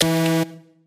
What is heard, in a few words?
gameshow chip fail